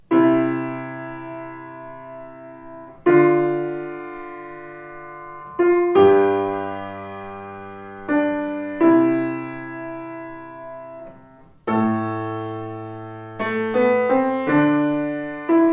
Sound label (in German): ave maria melodie bass, klavier